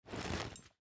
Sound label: cloth; gmod; arccw uc commons attachments